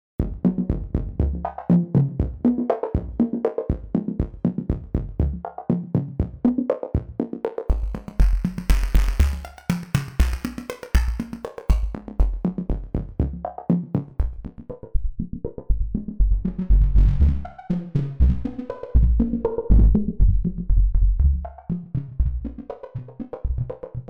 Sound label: Djembonga; Spark